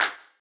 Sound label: clap f key, Royality free clap sound clip tuned to the F note. Loudest frequency: 5310Hz; percussion; single hits one shots